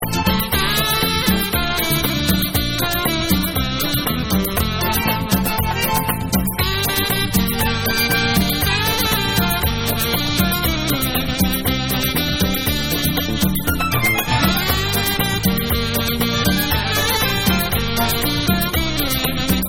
Version Instrumentale

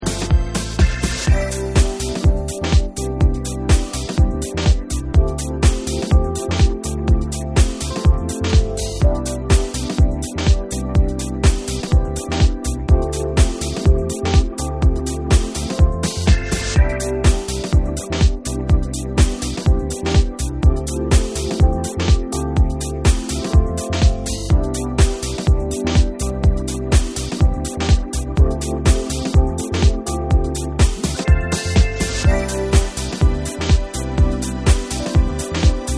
Soul Instrumental

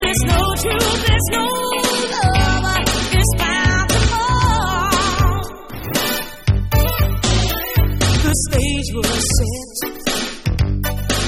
Vocal

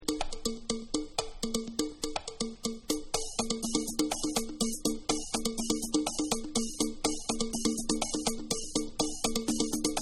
Party Beats

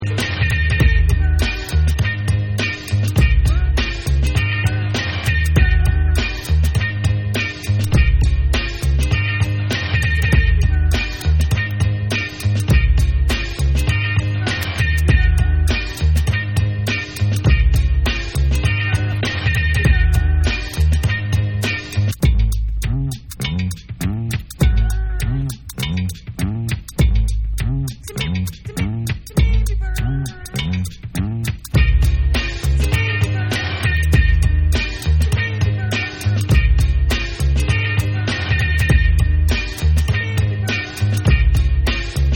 Instrumental

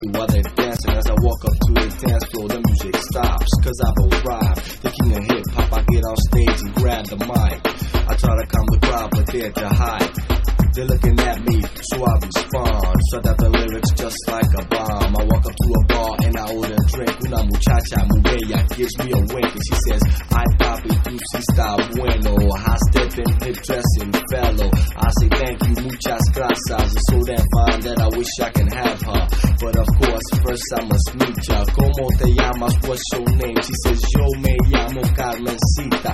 Beat Box Mix

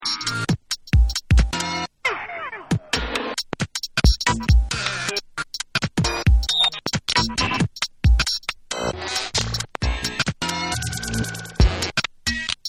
Karaoke

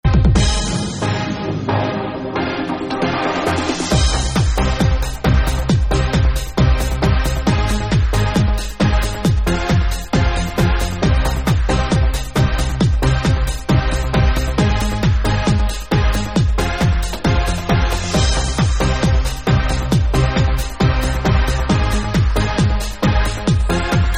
Main Instrumental

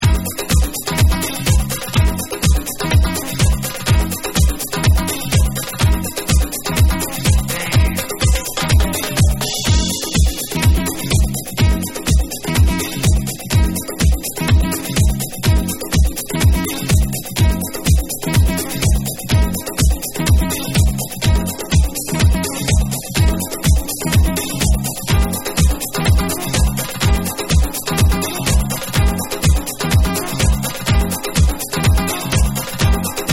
TRUMPET

